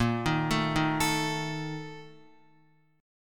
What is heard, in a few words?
Bb Major 7th Suspended 4th, Guitar; arpeggiated; JGuitar